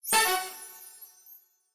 SFX Symbol Jackpot Win; Majhong Royale

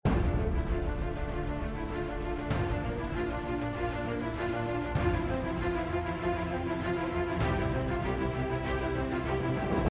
BGM gamble, slots; Realm Saga

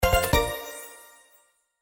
gamble Win; slots; House Of Luck

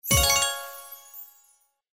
Symbol Scatter Win; Wealth Gods

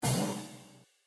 reel stop; slots; Marvelous Circus

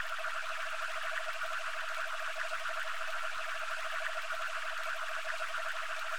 Spin; slots; Realm Saga

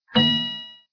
Spin Stop Special Scatter; slots; Ancient Scrolls

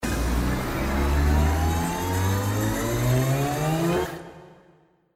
Scatter Anticipation; slots; Wealth Gods2

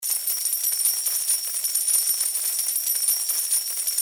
big Win Coin, slots

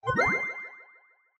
Natural Hand, baccarat; TABLEGAMES

